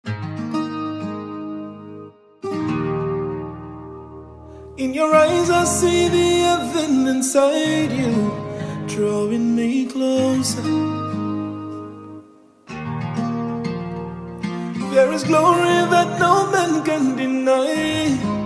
Acoustic version

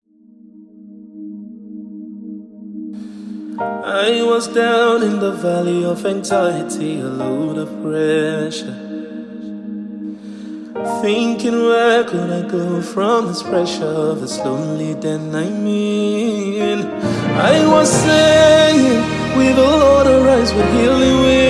Acoustic version

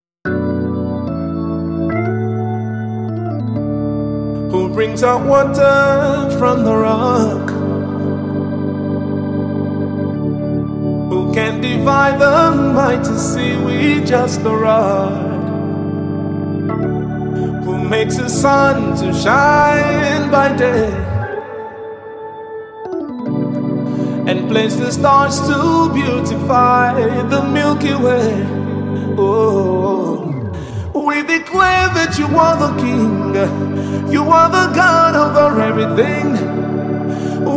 Acoustic version

